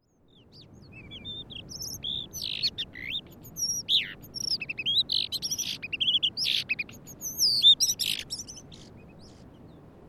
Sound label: isohiyo female